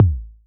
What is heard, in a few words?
tronic kick, Kawai XD-5